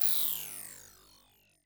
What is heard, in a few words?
Title Transition Laser Short; Adobe; Production Element